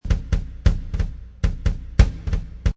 Loop Jazz Jam Beat Bass Drum 180, Jazz drum loop at 180 bpm.